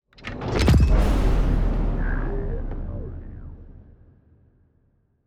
ship move; juggernaut; Stellaris; shroud

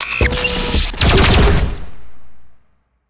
archeology event trap laser; Stellaris; ancient relics

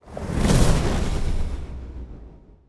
transport ship move; Stellaris; aquatics; ships

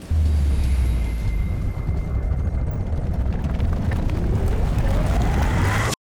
universe collapsing; rifts; Stellaris; astral planes

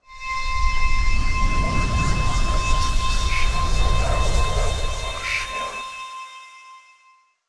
psionic comms; Stellaris; astral planes

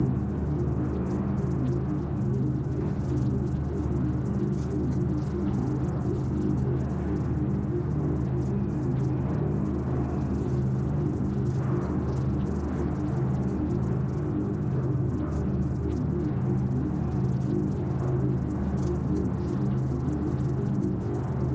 neuron calculator phase 1 idle

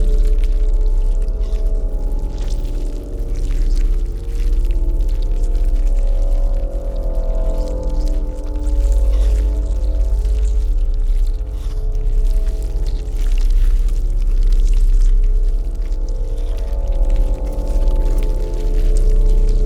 ant ship science station idle; Stellaris; biogenesis

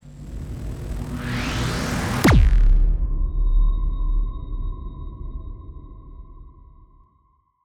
emp sound; rifts; Stellaris; astral planes

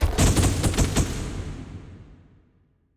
floodlight flashing; Stellaris; astral planes

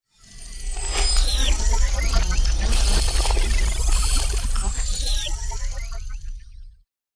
multidimensional noise; Stellaris; astral planes

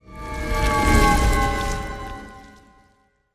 ant ship transport move; Stellaris; biogenesis; ships

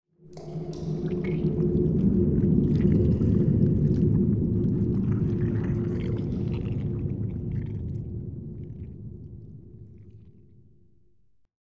quiet tunnels; rifts; Stellaris; astral planes

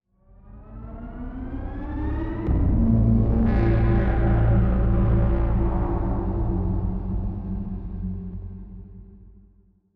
psi ship colony ship move; Stellaris; shroud; ships

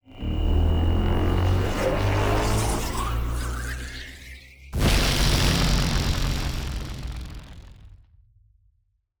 electric death; rifts; Stellaris; astral planes

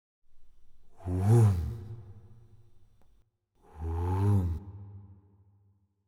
kaiju adult ship entity combat moving; Stellaris; biogenesis; ships

